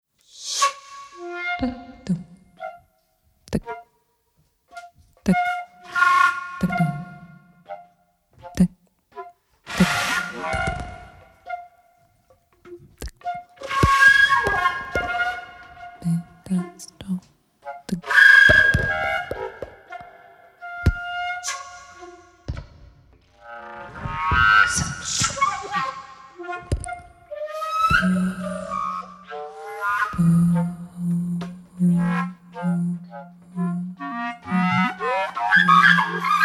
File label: Voc Flute